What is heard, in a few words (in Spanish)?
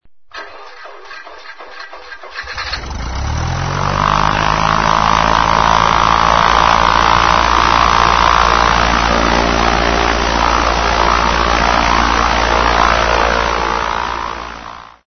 autos; Sonidos/transporte; Autos 4